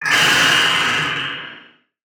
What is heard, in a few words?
attack; Barotrauma; Legacyfractalguardian